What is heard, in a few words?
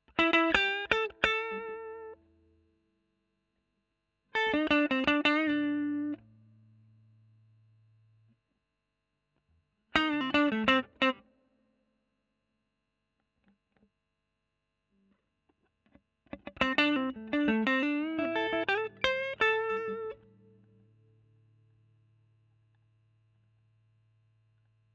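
E.GTR Royer OD, electric guitar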